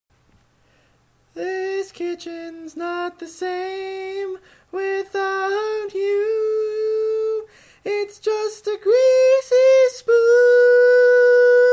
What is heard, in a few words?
Tenor, barbershop tags